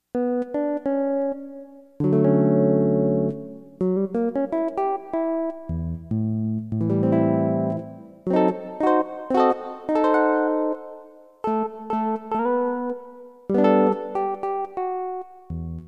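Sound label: gtr jazz, guitar jazz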